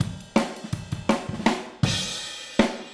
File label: Fill Overhead, Drums, 162 BPM; Drums from the Big Room The Mixes; Take Your Toms Off